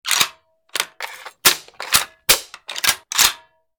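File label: front assault rifle reload; Original TK Sounds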